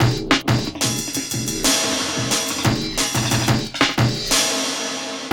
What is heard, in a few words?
Killer Beat, Drum & Bass, Beats & Breaks